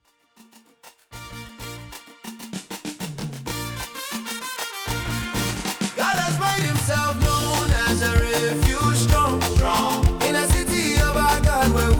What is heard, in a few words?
Afrobeats; Psalm 48 Glory of Zion